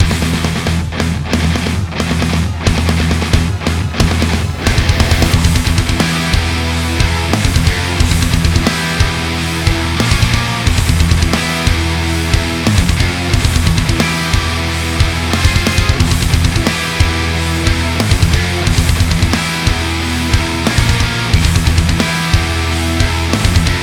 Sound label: Drums in the deep; Forgive Me Father